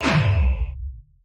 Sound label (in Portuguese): Sfx - Proximo Ao Portal; Neon Souls; PS4 PS5 switch windows xbox one xbox series xs